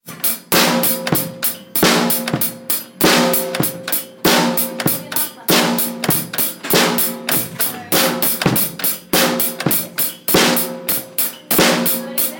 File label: drums